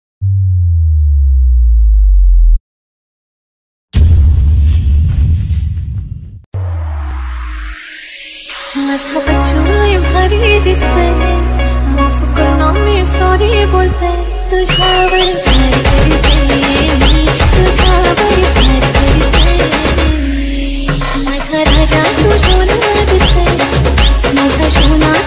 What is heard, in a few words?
marathi ringtone marathi song ringtone love bgm ringtone bgm